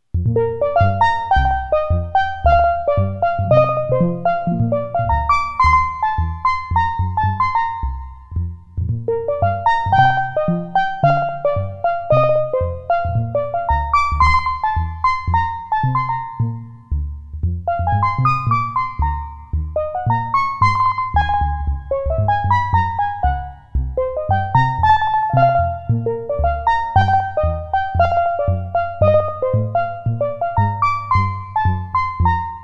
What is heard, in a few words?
steeldrum